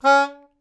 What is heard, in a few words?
Melodica Short; Short Notes Chromatic